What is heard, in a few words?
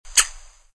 cork